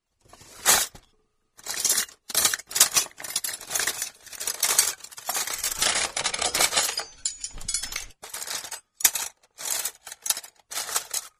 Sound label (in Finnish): haarukat